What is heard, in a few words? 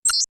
computer beep; Business skieme Beta 1